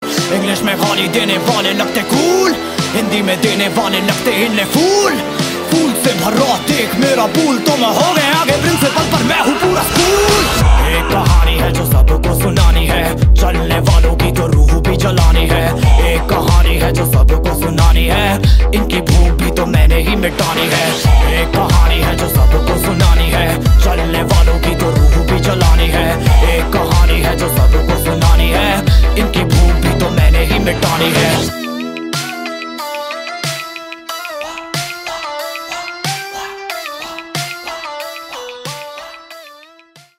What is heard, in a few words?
rap song